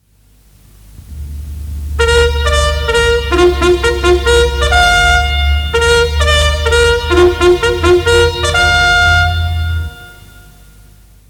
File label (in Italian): libera, squilli di tromba in caserma